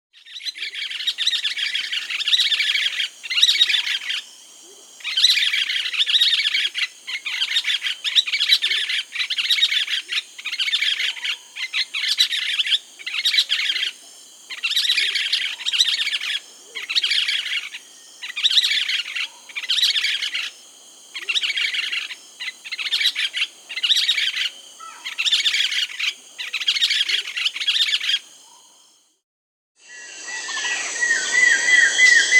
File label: Yellow-billed Nunbird (Monasa flavirostris), Peru, Ecuador; S. America